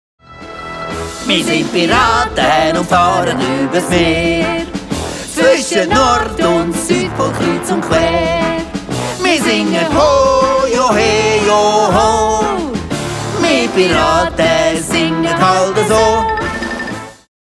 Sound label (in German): Trickfilm Lied; Kaeptn Sharky